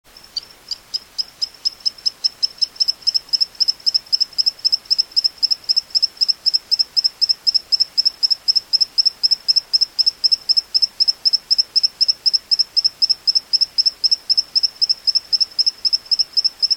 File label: Ameerega bilinguis